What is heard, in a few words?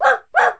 bark; ICQ